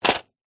household medicine tablet pack box set down carpet